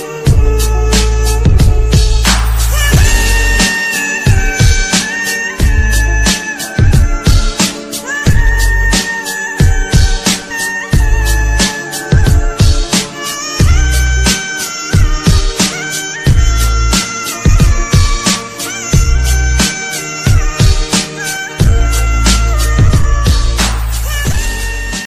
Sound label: english ringtone